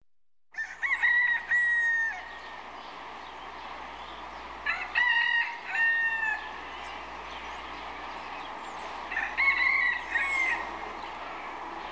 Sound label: village crow of a rooster against the background of a bird and a stream